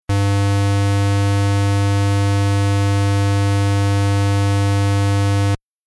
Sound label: distortion overdrive sine dist